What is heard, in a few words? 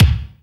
BD, DR BD; Best Service Gigapack 2